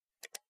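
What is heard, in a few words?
Low pitched Mouse Single, mouse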